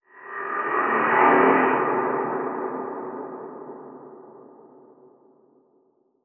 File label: Taunt Drone